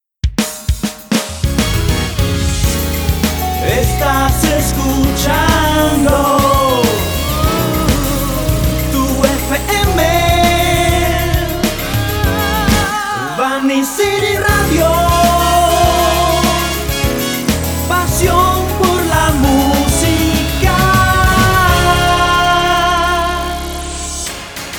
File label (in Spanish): Radio Pop Completa FM; BanicityRadio